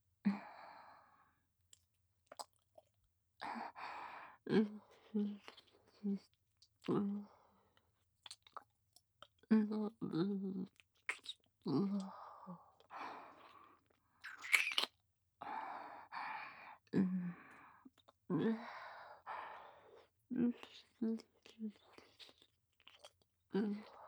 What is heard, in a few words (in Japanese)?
乳首舐め（ゆっくり)